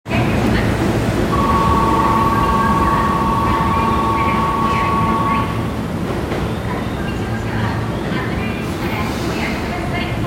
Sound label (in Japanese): 京王線高幡不動東, train sounds